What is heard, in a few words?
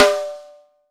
12 SNARE, MAPLE SNARE